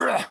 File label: Attack Small